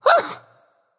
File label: nv ugh; smite; voicepack; ganesha